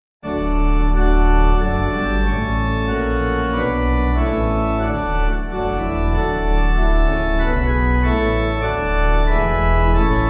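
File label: Pipe, Pipe Organ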